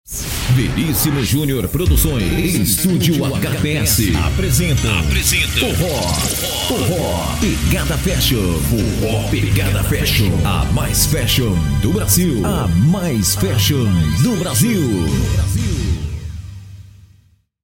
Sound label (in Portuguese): forro abertura